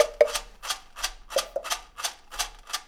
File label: Roots Reggae; Sounds Good - Roots Reggae